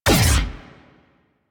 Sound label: Gatling Laser Shot; Railjack